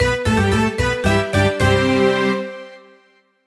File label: Something Good Ditty